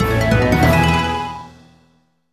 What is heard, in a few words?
Fanfare Rank Up; Mario & Luigi - Bowser's Inside Story